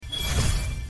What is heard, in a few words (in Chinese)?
裁判暂停声音; xitongyinxiao